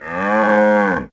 Cows Mooing; Civ4 warlords; Resouces and Improvements

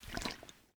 bota bag slosh; Rust

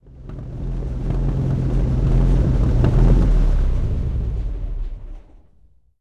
comet engine int stop, tanks; War Thunder

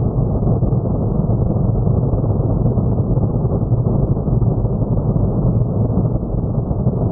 Aircrafts Plane Gear Wind; DCS

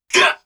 pain grunt, Human Pilots; Titanfall 2

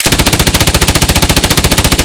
Automatic MG3, Weapons; DCS

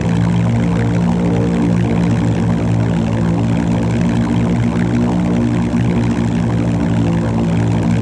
big plane; World of Tanks Blitz; ambient medvedkovo

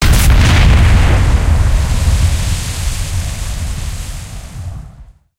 torpedo explosion

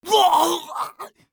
death; Escape from Tarkov; scav1

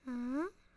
Setlr Child Fidgt Vox; Civ4 warlords

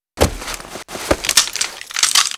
refrig react

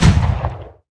mk108 lastshot left, guns

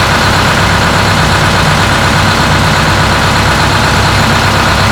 vehicle city bus idle

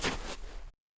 Foot Snow; Civ4 warlords

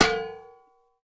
2 handed sword strike; Rust

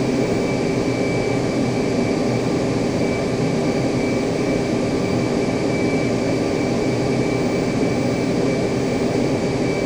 Aircrafts Engines F15 In He Amb R; DCS

